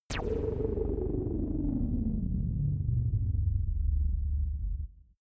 space ship mini blast; laser

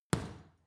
boxing punching bag side hook medium

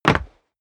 step boots wood parquet jump; schritte

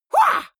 goblin fight shout attack; vocals; comedy-cartoon; soundpool

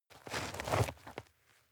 step dirt heavy boots scuff soft; menschen; schritte

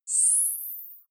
zoom out, signale

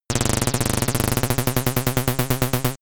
wobble; comedy-cartoon; soundpool

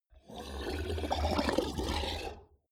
beast gargle large hard; comedy-cartoon; soundpool

